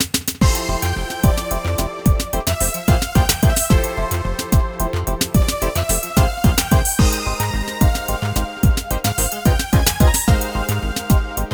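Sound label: BGM Toy Sports Result Draw; clubhouse games 51 worldwide classics 2020 switch gamerip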